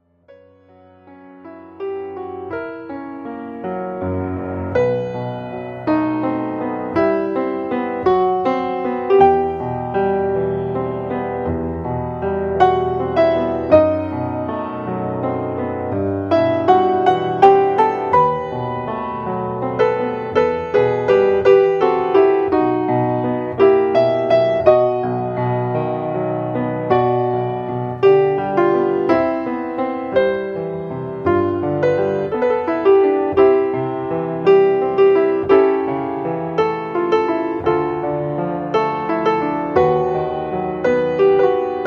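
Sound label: Chopin E minor nocturne, solo piano; wedding music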